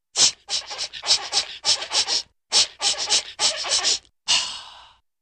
Dog Sniffs Vocals

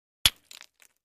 Brick Hit Hammer

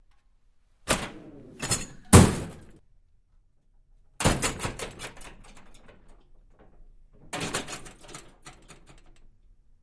Door Push Bar Wood Do

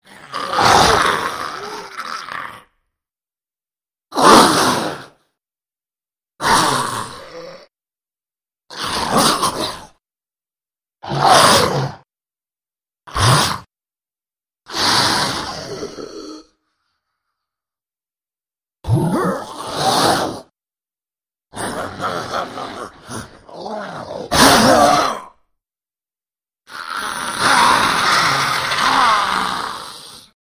Attack Vocals Comp S, monsters

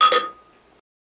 Glasses Clink Together, Clink Clunk; glass clinks

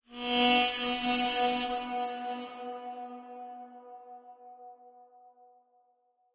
Mouth Organ Steam, harmonica; woodwinds

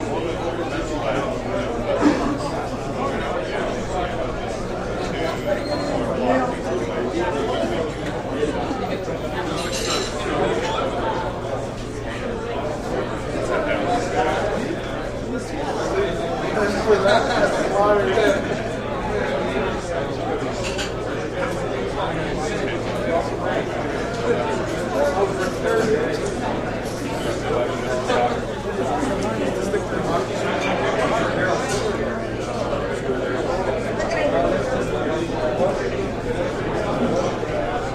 General Party Walla D, restaurants & cafes; General Party Walla, Some Dish Rattle

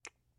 Soft Kisses; Soft Light Kisses. - Fleshy Kisses

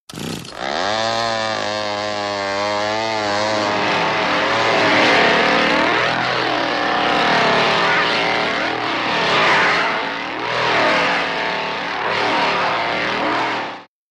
Chain Saw, chainsaws

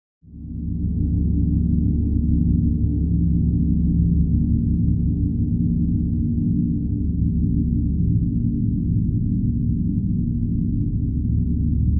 Bed Dark Pulsating

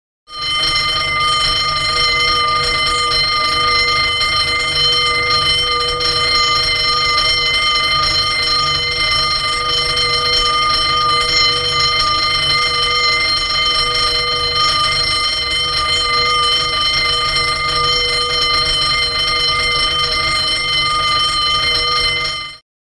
Alarm Bell Constant

